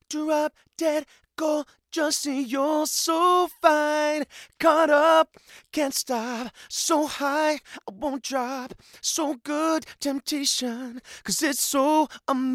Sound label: male vocals; lewitt